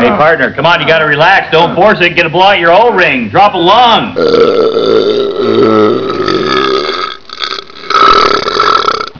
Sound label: burp